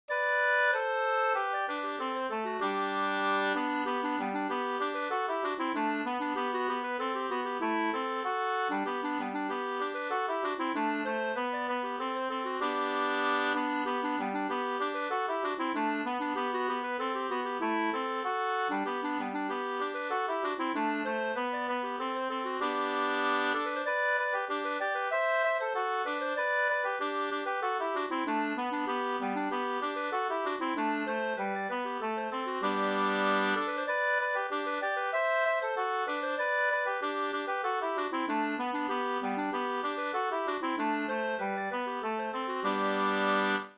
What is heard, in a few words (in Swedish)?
engelska; Stenhuggare-engelska